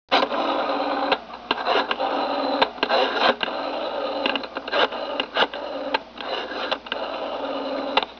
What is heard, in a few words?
DIAL